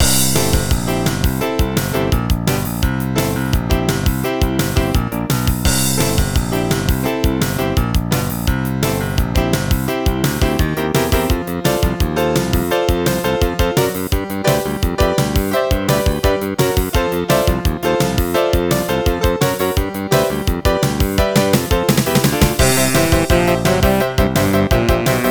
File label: Jazz & Banjo